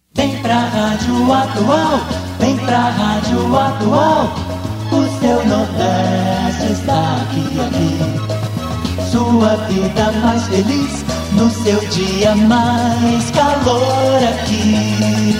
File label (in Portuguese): Radio Atual SP vinheta